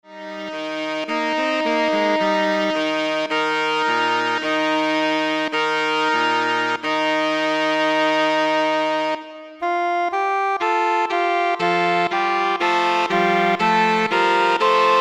Saxophone Ensemble

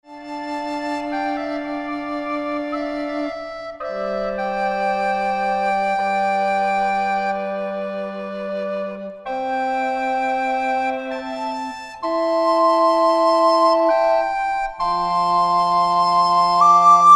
Recorder Ensemble

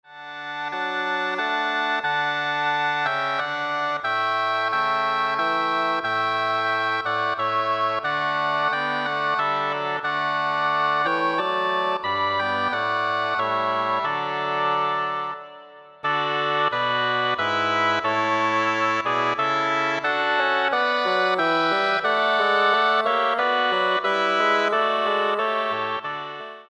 Double Reed Ensemble

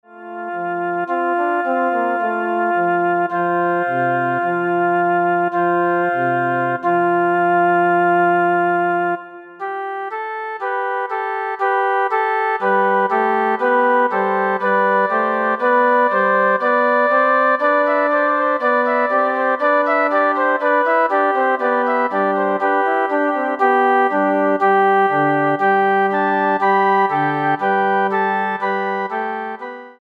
Flute Ensemble

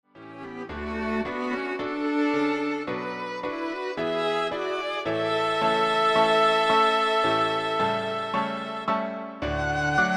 Piano Trio

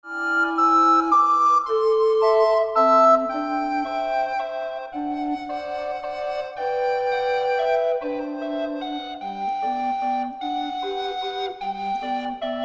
Recorder Ensemble

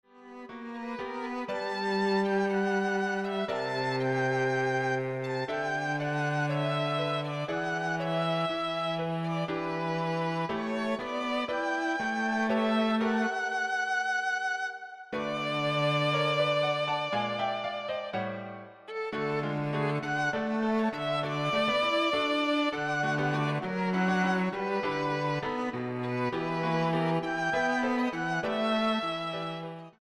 Piano Trio

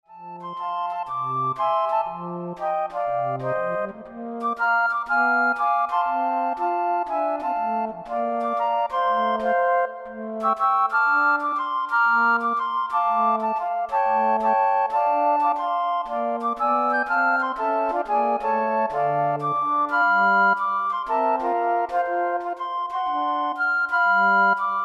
Flute Ensemble

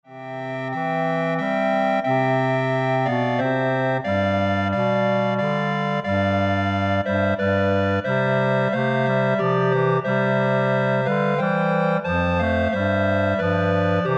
Clarinet Ensemble

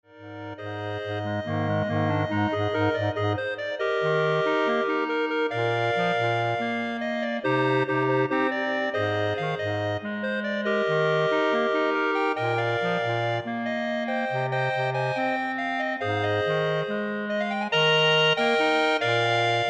Clarinet Ensemble

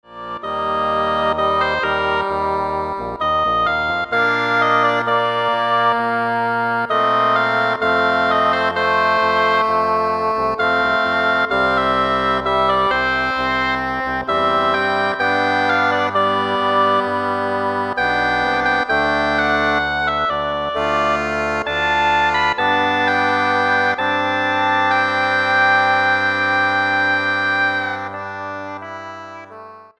Double Reed Ensemble

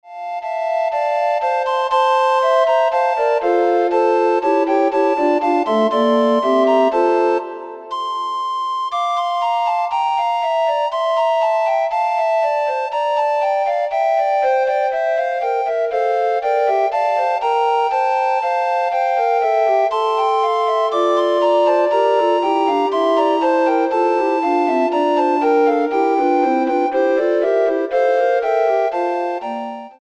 Recorder Ensemble

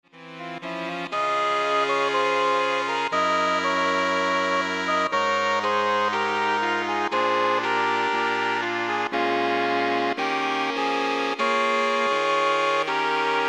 Saxophone Ensemble

